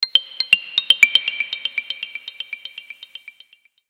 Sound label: notification; Infinix; A88